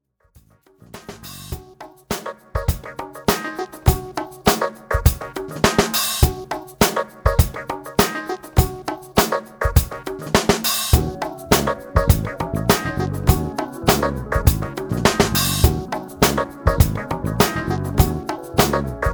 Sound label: chicago groove